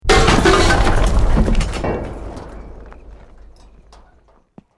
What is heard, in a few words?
BUILDING COLLAPSE SCAFFOLDING SMALL